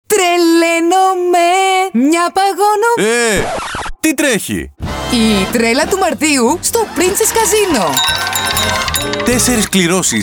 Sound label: march